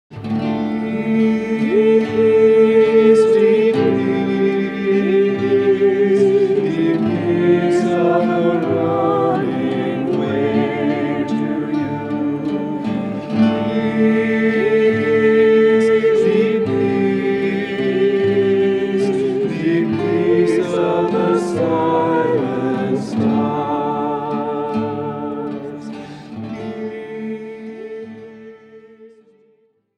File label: DeepPeace